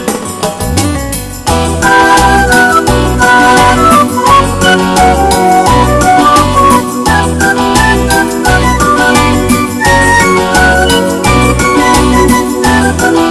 A Great Limbo Dance

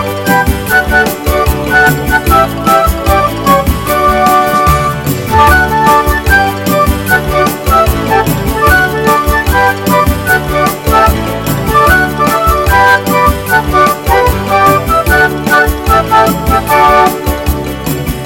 Hot and Fast

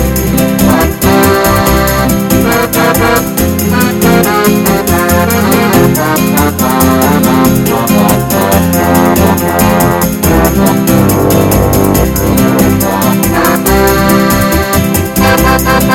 A Beautiful Tango

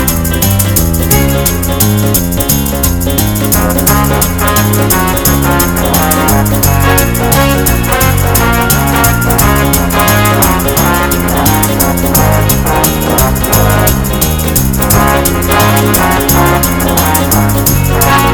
Hot and Fast Movement

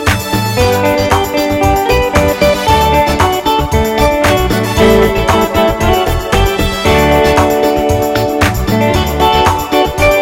Happy and Smooth Pop

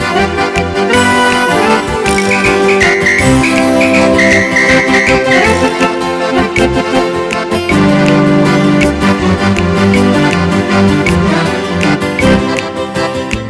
Super Banda Song

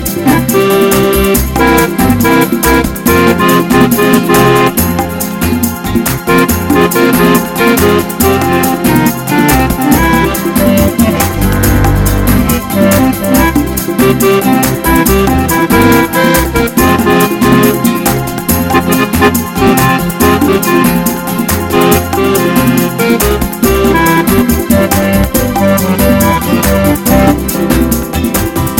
I Love to Tango

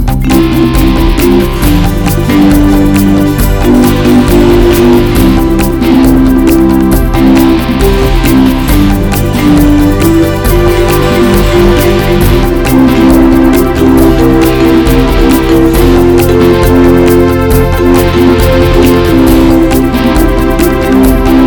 Fast and Hot

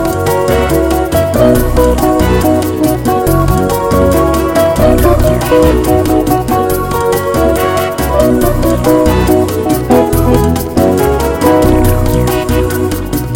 A Super Cha Cha

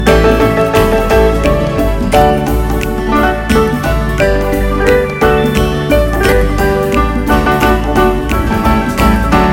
Pretty Five Fourths Swing

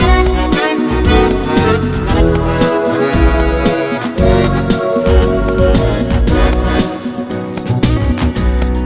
Very Smooth Ballad

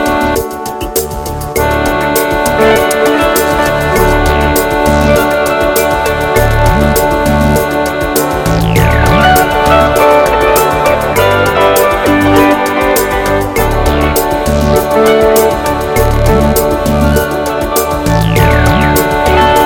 Hot Pop Song

